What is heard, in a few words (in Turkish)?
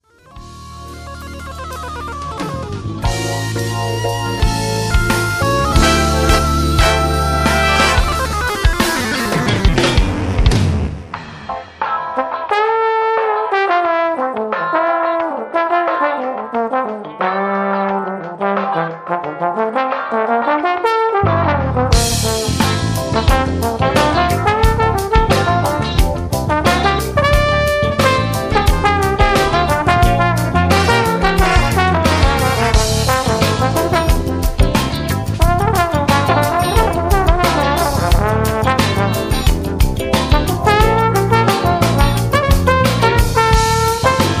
trombon solo